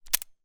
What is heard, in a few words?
weapon gun smith wesson 642; still north media